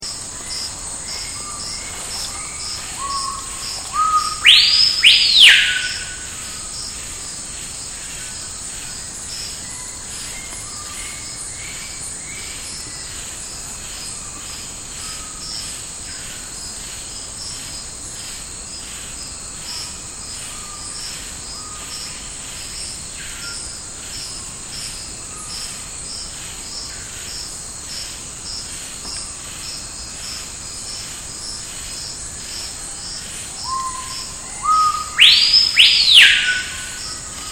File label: Screaming Piha